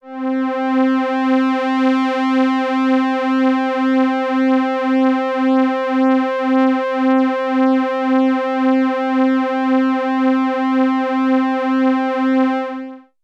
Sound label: Oberheim OB-8 PAD Memory Low - C; Synth; One Shots; Cymatics; Fantasy Synth Sample Pack